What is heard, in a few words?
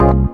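synth chord; Best Service Gigapack 1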